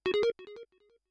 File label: three tone; Kenney's Sound Pack; Digital Audio